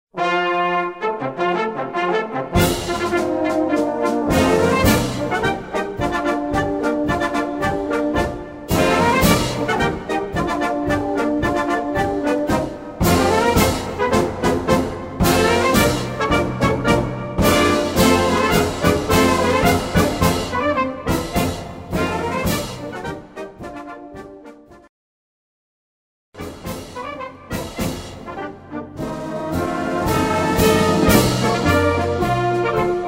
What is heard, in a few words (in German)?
Regts Marsch